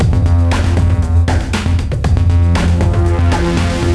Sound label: music menu; chromium-bsu